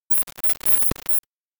weird waver; anemoi

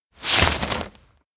umbrella open hold; anemoi

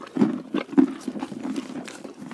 Horse eat; anemoi

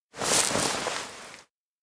situp; anemoi

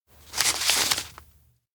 lottery paper open; koyomi; anemoi パッケージ版

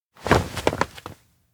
steps outdoor town dodge; anemoi

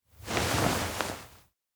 sack big takeout; anemoi

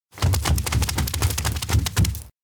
pizza dough slap hard; anemoi

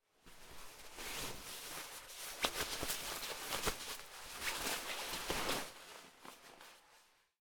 cloth gasagoso; anemoi

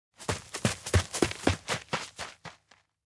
steps outdoor bush run; ken; anemoi

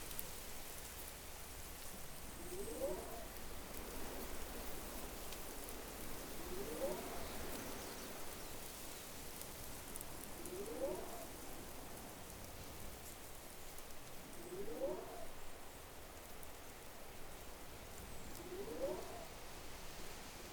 outdoor grassland windmill; anemoi

